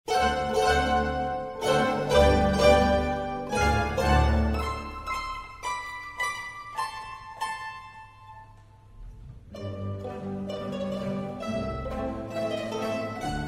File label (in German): Polka, Mandolinenorchester; HansjoergelPolka